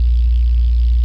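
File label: power up; Splashdown; XBOX; Waypoint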